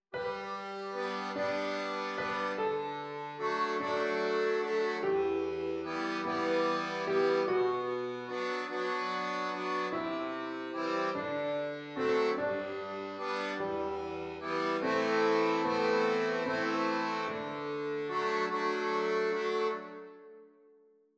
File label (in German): Akkordeon